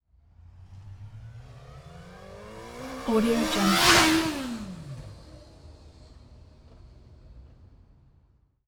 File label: yamaha fz8 passby; soundmorph